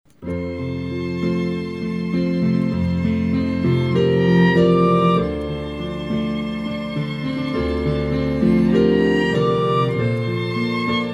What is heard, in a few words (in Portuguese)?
Piano e Cordas